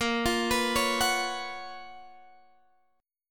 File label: Gb Major 11th Bb, chord